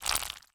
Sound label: Pasta Take; MiSide